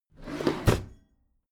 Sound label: packed drawer smooth open heavy